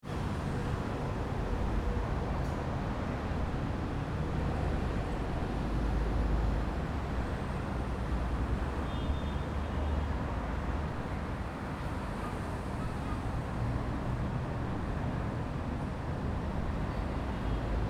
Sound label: Small city main street bus car; atmosphere